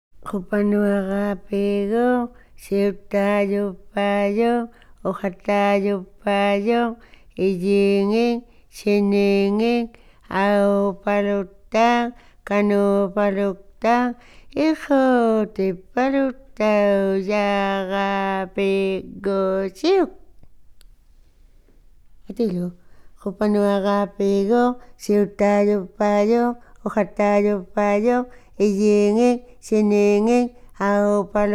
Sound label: Little Bird Song, INUIT